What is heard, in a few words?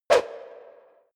sword reverberated whoosh